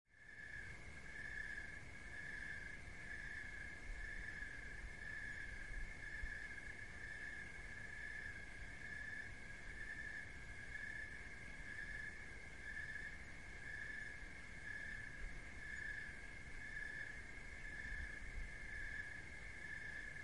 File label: crickets